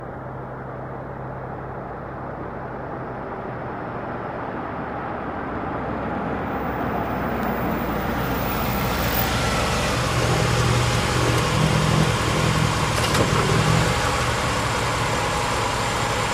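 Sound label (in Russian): priblijenie avto, approaching car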